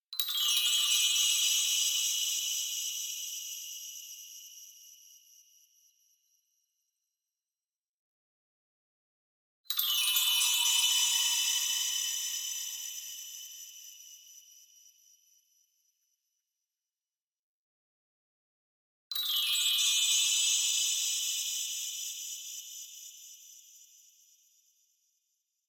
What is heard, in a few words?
SHIMMER Xylophone Delay Sparkle Vibrato; Anime Essentials